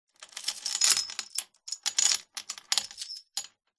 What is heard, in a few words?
industrial chain small attach to wrap around metal pole